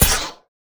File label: explosion smoke; ufoai; weapons